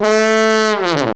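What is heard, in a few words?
FLUGELHORN FALL; BRASS2; FLUFALL1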